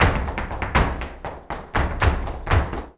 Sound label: Flamenco, Tapping, Bulerias, 121; ProSamples vol.46 - Flamenco